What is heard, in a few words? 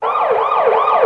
police